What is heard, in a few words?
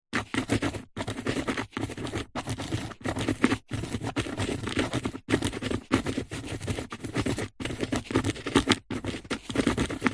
office pen biro ball point write fast on cardboard piece